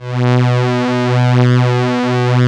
Strings low; instrumente

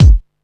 kick

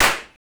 Biting Dist Clap; percussion

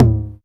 perc, Single Drums; percussion

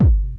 Double BD & FX, percussion

